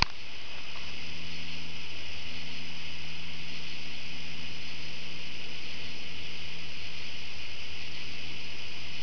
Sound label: night sounds